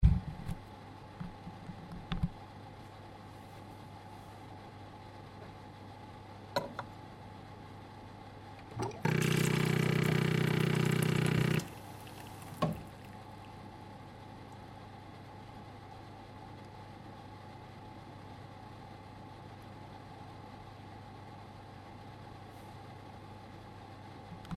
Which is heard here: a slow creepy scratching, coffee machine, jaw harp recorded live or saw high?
coffee machine